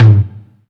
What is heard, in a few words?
Tom Vinyl, Drums; One Shots